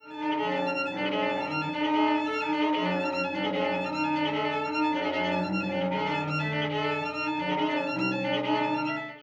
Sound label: golden ratio harmony